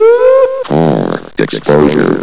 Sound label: die